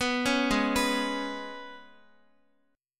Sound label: Ab Minor Double Flat 5th down Guitar; Abmbb5; arpeggiated